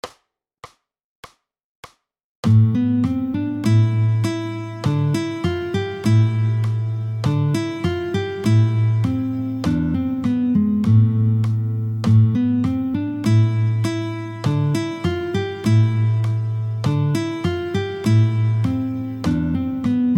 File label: Spanish Dance